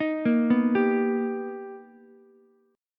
B Augmented Major 7th B Ukulele, chord; B+M7; arpeggiated; JGuitar

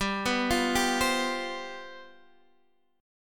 C Major 7th Suspended 4th, G, guitar chord

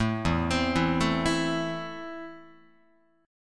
E Suspended 4th Sharp 5th, Guitar, Esus4#5 chord; arpeggiated; JGuitar

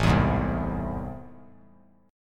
A Minor Major 7th, down; strummed; AmM7; JGuitar

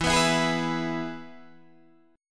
E Major E down Guitar Open D

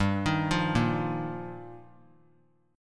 G Minor 7th Sharp 5th; Gm7#5; arpeggiated; JGuitar; 19,22,20,20

